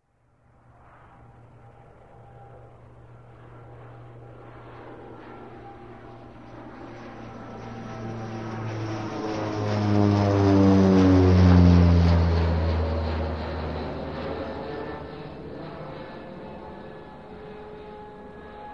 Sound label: Turbo Prop Take Off; Sound Ideas; Series 2000